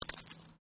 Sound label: Coin Penny Multiple Drop Stone